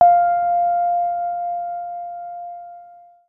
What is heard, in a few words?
Synth Sound; Vengeance Samples; VEE Synth Shots